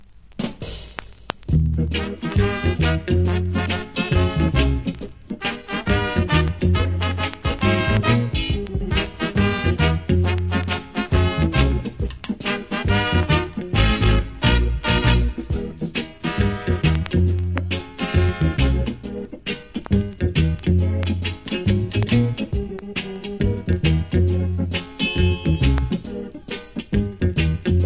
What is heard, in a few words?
instrumental